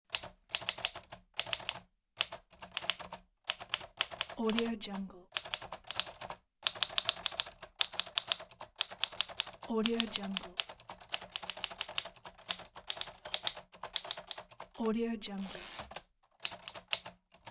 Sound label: typewriter